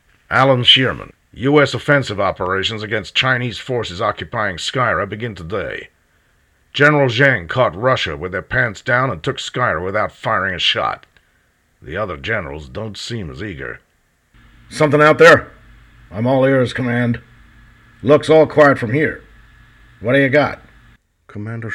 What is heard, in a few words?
Military Videogame